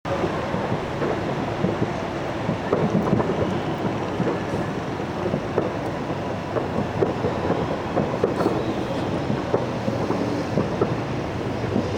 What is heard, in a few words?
adana new year